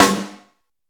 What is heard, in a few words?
crisp steel snare drum sound g key; snare drum samples